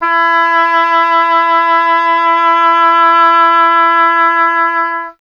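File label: oboe, wind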